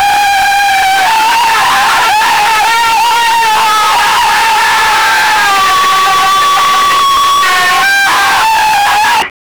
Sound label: screaming meme